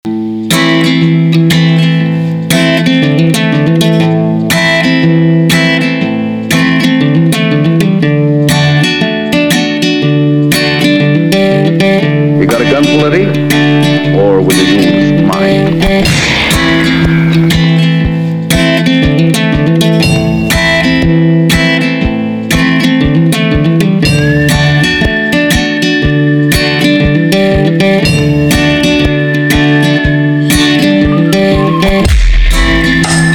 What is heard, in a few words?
Western Firefight